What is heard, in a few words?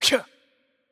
Attack Light; bloom minigame